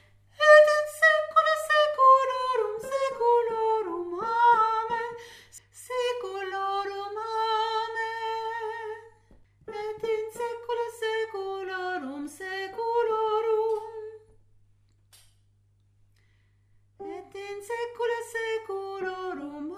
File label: et in saecula voix